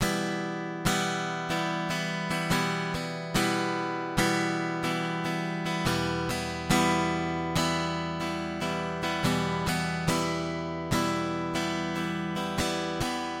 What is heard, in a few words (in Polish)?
e-moll wolno